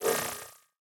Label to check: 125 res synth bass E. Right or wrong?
Wrong